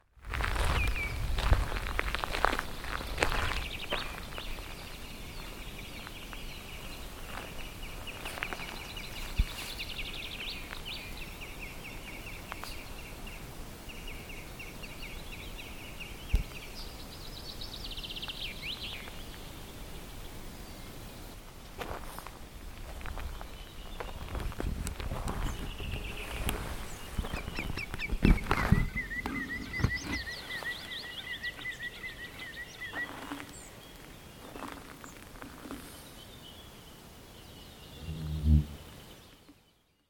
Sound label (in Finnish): valkoviklo, linnut